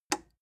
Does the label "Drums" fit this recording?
No